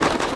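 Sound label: familiar stand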